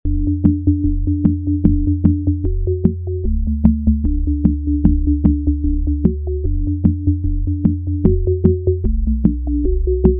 sine wave stereo

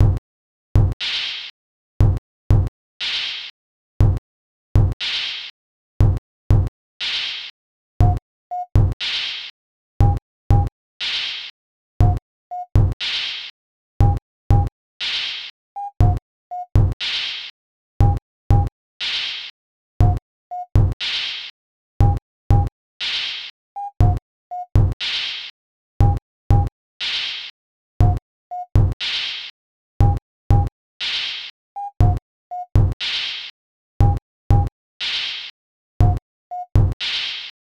Sine Wave

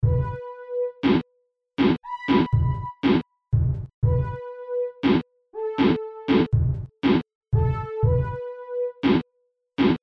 sinewave

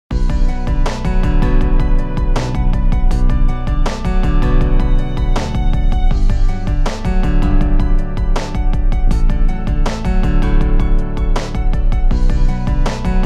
MIDI

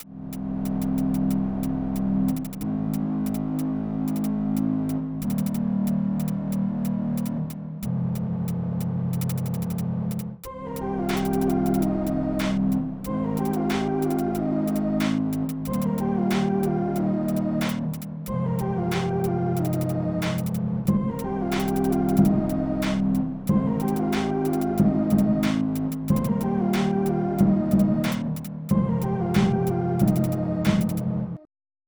Sine

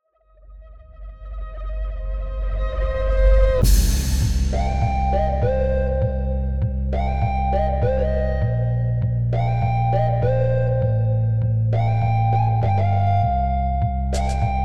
sinewave

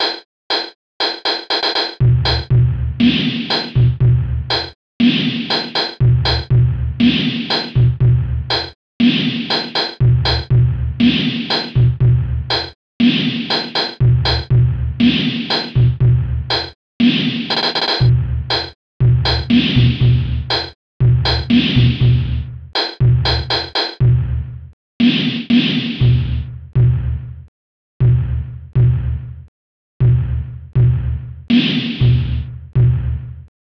sine wave 1k

